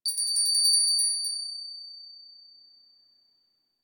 Seance Bell Ring A; Warframe; Harrow

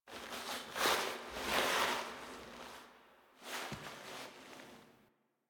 React Pulse Operator Foley Shake; Warframe; The War Within

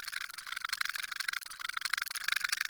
Shrimp Lantern Move Loop; Creatures; Baliq

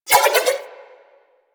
Nunchaku Spin Whoosh; Nunchaku Prime; weapons

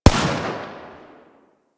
Heavy Pistol Shot 3D; Warframe; Eidolon; EidolonGrineerMarinePistol

